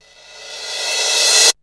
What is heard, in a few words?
flush; SoundFactory; SMPMANIA; EFFECTS